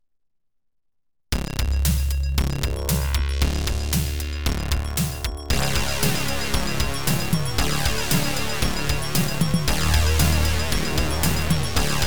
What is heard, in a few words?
OPL3